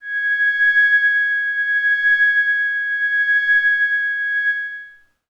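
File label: Glass Howl Kawaii C sharp 6; pads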